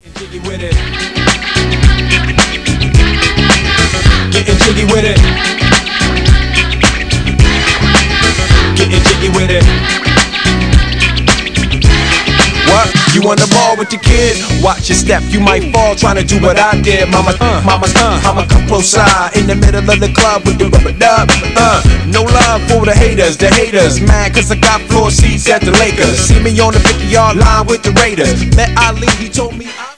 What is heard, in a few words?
jig